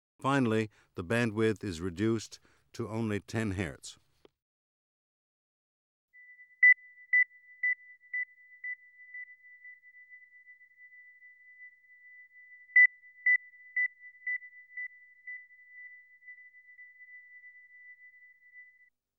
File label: Critical bands by masking